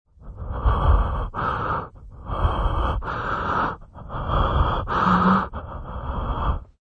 Breath, Close miked stressed terrified breathing - Male; Scary Breathing Sound Effects; Horror